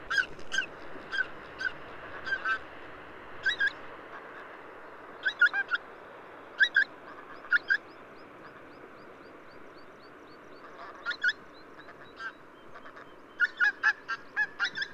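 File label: pink-footed goose, squeal, honk; moedrudalur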